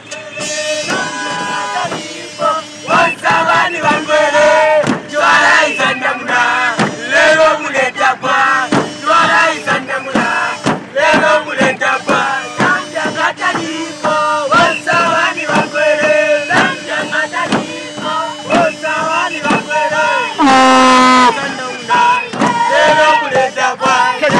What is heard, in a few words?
mini bus choir